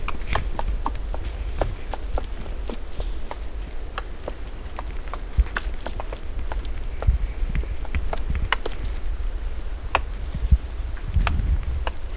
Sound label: Sciurus vulgaris alarm